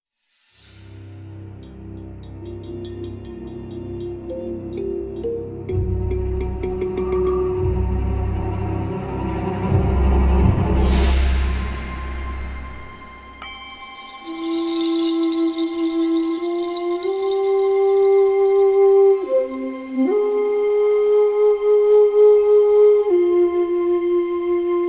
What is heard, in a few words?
Music Stem; Level 09